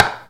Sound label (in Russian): udar skalkoj po stoleshnice